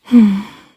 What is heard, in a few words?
sigh; ningguang